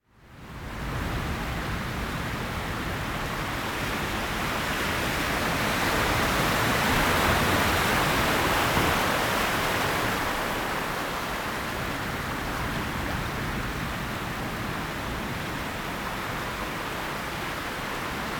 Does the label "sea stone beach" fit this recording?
Yes